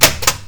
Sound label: Drop Target Reset